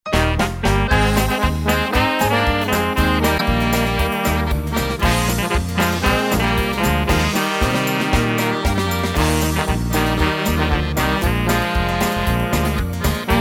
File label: Czech Farmer Polka